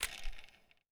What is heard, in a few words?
spin; ArabDesert